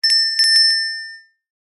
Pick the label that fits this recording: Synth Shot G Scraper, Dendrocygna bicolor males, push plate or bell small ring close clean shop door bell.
bell small ring close clean shop door bell